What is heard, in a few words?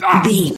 voice male hurt pain